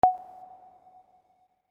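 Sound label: Sine Pluck F sharp 1; Blek; Android; Wii U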